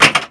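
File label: Flipper Up, pinball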